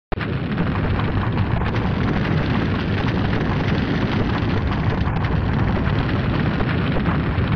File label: burning